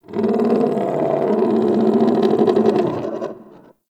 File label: Creature Growl; Warframe; Tau